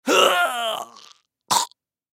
Human Grunt; Grunt Long Choke Element Male 25 Yrs; Grunts; Blastwave; Humans

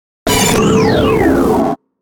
Logo Hiss Harsh Accent; Sonic Logo; Production Element Imaging Element Accent Transition; Blastwave

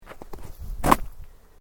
Footsteps Boots Gravel Fine Jump; Footstep Foley; Human

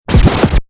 Turntable Scratching; DJ (Sound Effects:Musical)

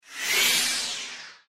Sci Fi Spaceship; Spaceship Door Open; Spacecraft

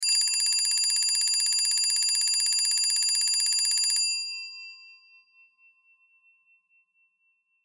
Alarm Clock Bell Ring; Alarms; Electronics; Household; Blastwave

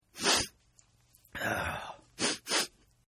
Sniffly Cold Male; Sickness Sounds; Sniffling; Human; Partners In Rhyme

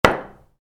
Hammer: Impacts Wood; Hammers; Tools; Hammer Sound Effects; Hammers (Sound Effects:Tools); Stuart Duffield

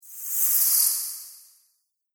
Wizard - Spell Of Whispers; Magic Sound Effects; Magic Sound Effect - This Magical Sound Effect Would Be Perfect For Adding A Magical Dimension To Your Role Playi

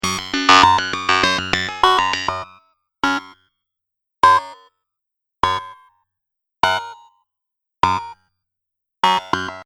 Drum Loop 100 Bpm; Nu Retro Fragment; Percussive And Rhythmic; Seamless loop; Andrew Fleming

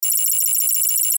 Data Readout, Digital, Distortion, Warble, Sweep; Multimedia; Production Element, Imaging Element, Accent, Transition; Blastwave

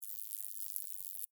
Insect Wings Fly, Steady, Bug; Animal, Insect, Wings, Fly, Steady, Land, Bug; Wing Sound Effects

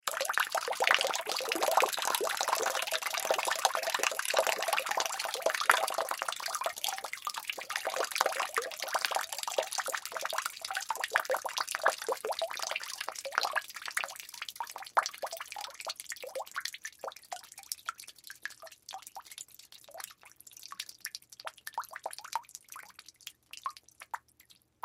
Drips Pour Splatty, Water Drips Pour Splatter; Dripping (Sound Effects:Objects); Blastwave

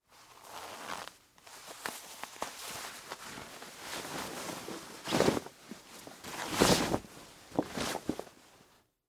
Jeans Take Off, Foley, Clothes; Dressing; Human; Blast Wave

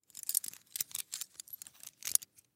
Key Ring Remove Carabineer; Rustling; Foley

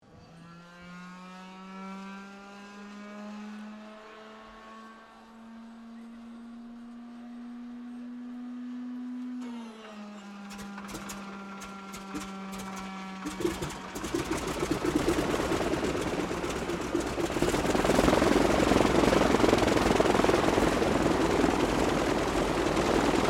AIRCRAFT AT-6D HARVARD MK III STARTUP TAXI; Temora Harvard MK III; Airplanes; Vehicles

